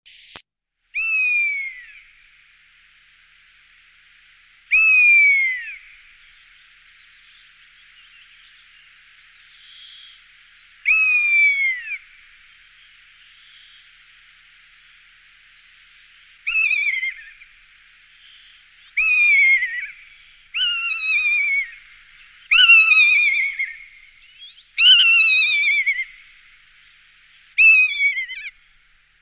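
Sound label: zimnyak golos, rough-legged buzzard voice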